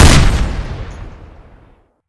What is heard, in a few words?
minigun; garrysmod